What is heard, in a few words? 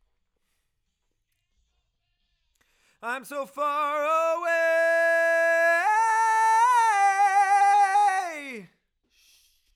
Chorus